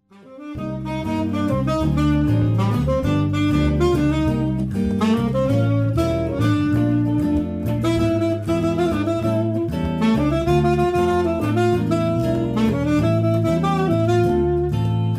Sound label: jazz pop